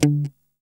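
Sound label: guitar, funk guitar, Strats, clean electric guitar; Clean E.Gtr; PYB Strats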